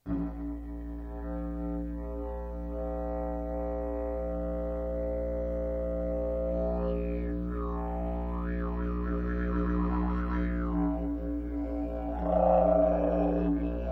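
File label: pvc A Sharp, didge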